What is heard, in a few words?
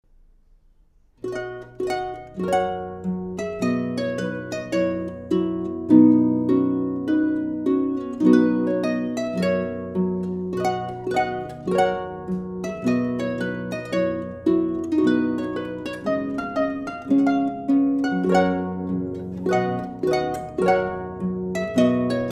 Harp; Trumpet Tune